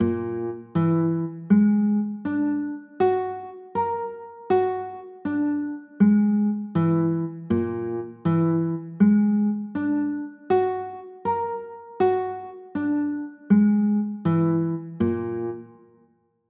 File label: e 9b5, chords